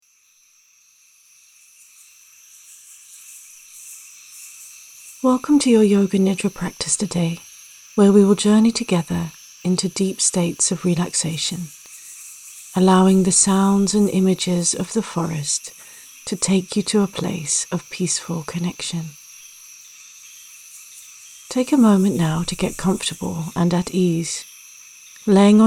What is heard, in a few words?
Rainforest breath; yoga nidra